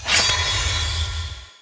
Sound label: Enchant Good